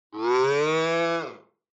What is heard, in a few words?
Cow Synthetic